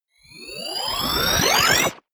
sweep

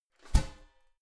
garbage can close lid damped

